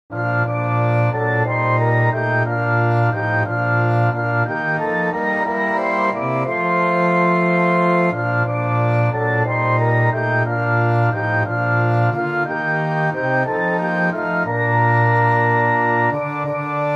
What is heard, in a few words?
Orchestra